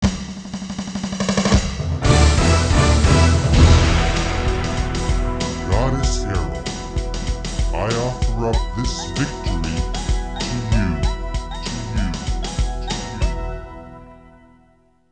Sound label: Victory!